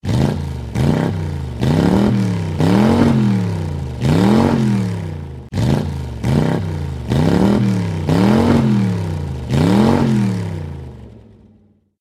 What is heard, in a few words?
Muscle Cars Sound